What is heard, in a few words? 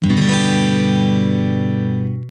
g maj 320003, guitar chord